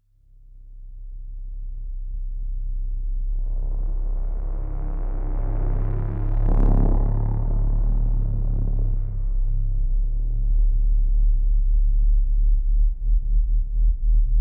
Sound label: Hollow Frequency; despair dystopia for heavy discomfort; psycho horror